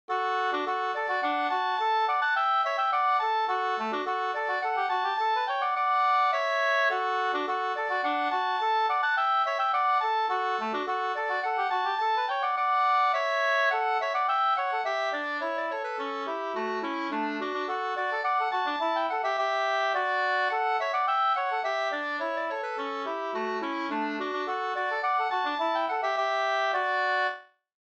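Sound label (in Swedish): slangpolska, two-part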